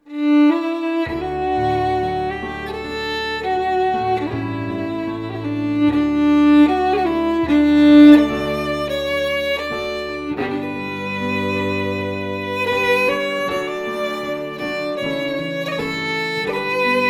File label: FIDDLE CELLO PIANO; Auld Lang Syne; Jink & Diddle Book o' Tunes 2021